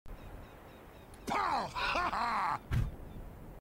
heavy pow haha; audio meme

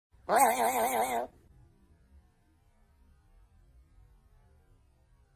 red bird laughing; audio meme

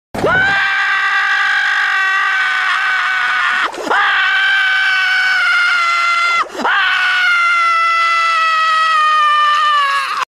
screaming; spongebob; squidward; green screen; audio meme

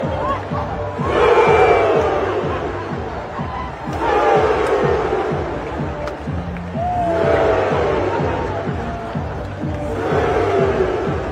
crowd suiii; audio meme

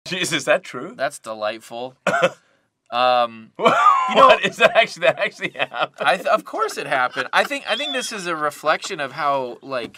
laughing; elon musk; audio meme

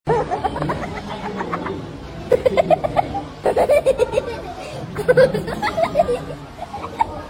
kid laughs; audio meme

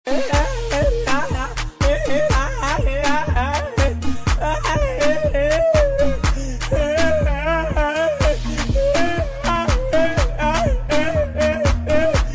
man crying; audio meme

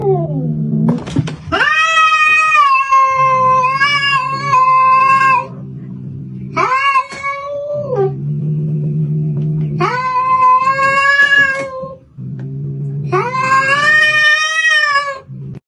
cat hitting another cat fight; audio meme

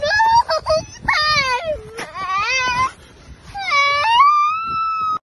dramatic kid; audio meme

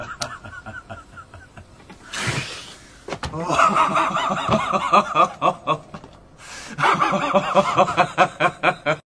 laughing; shea labeouf; audio meme

